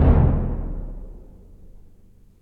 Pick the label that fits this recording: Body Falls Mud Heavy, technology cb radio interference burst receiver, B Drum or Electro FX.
B Drum